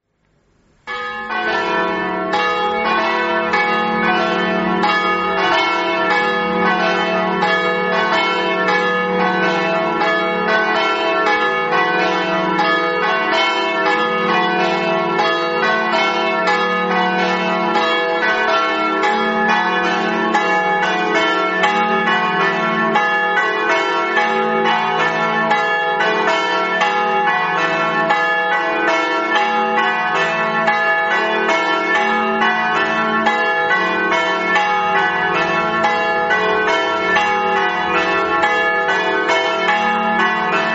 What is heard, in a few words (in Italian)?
campane